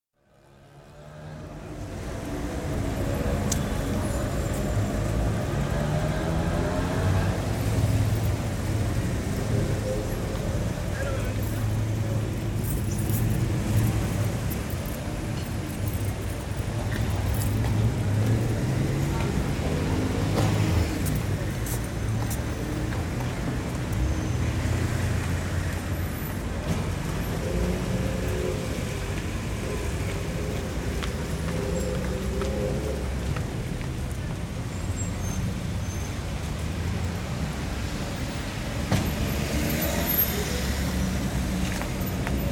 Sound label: Footsteps Pavement, High Street in a small town, with traffic and footsteps; Footstep Sound Effects; BBC